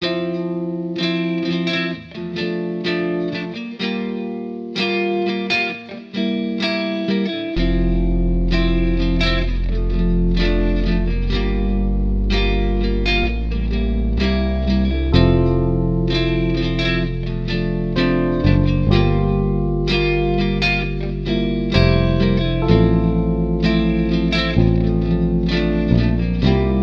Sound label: NIGHT STROLL, F, 127 BPM